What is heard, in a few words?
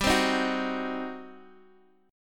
G Major 7th Suspended 4th Sharp 5th, Guitar, Open D; down